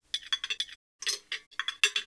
Teacup; Seer; GamigoNA

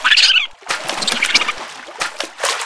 Penguin Walk; Seer; GamigoNA

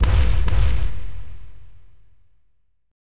Zombie Walk

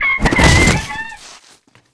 die

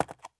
Pegasus Footstep

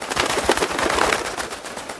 Metamorphosis bird wing

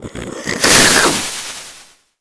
King Slime Skill; Seer; GamigoNA

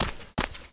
Little Hob Run

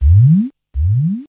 Totem Walk; Seer; GamigoNA

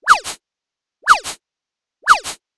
Heart Mouse Idle

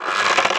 Stone atk; Seer; GamigoNA

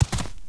dog foot; Psykendog; Seer; GamigoNA

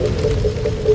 Ice Worker walk; Seer; GamigoNA

